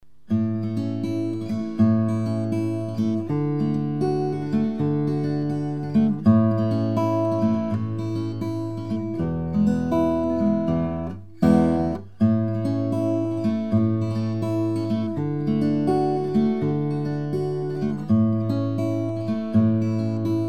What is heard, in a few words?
Guitar, OM guitar; Peter Coombe Mandolins and Guitars